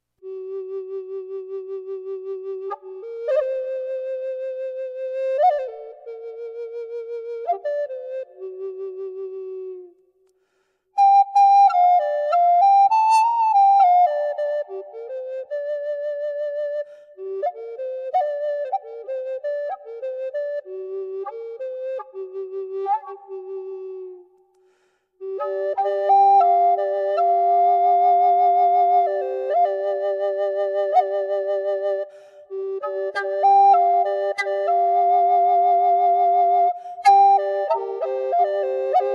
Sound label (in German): G, indianerfloete